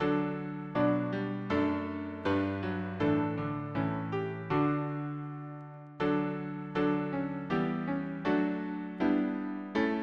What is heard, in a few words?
harmony piano